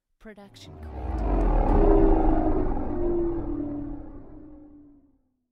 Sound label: star cruiser flying; soundscrate